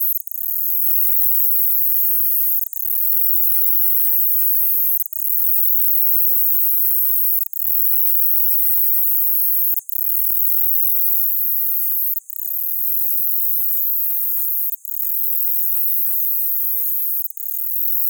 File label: Myopsalta crucifera Kings Plains